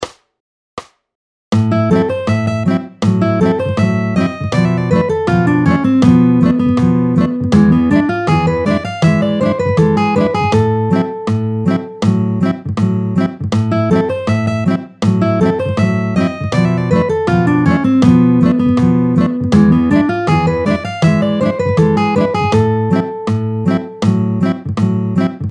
Ensemble Impro. Vite 2 2 tempo 160, manouch; 16-08 Ensemble. Impro D. Givone. Vite, 2/2, tempo 160; Lam6, Rém7, Mi7

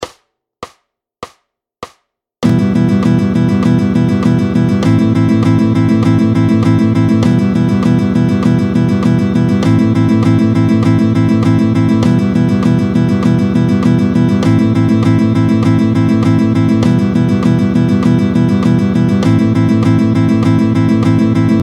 Vite Doubles croches, Doubles croches. Vite, battement

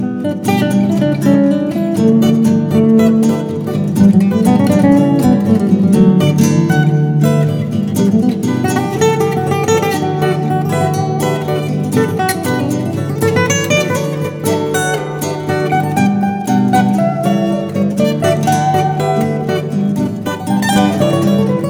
Guitare ac Rythmique Solo

